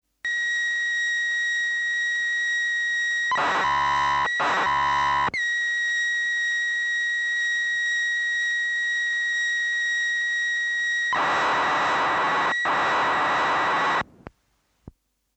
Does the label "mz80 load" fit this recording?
Yes